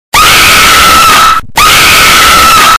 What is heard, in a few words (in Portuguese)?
susto do ahhahahaha